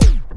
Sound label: scratches; Akai MPC-500; Perc